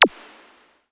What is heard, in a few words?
Kawai K5000W Laser Zap